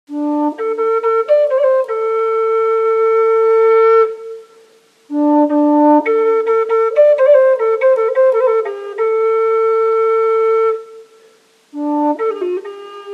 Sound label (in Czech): Indianska fletna penta D